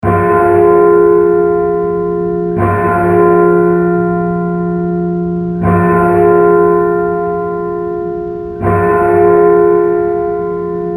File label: Big Cathedral Bell